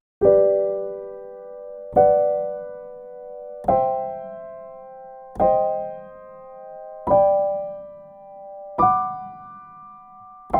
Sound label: piano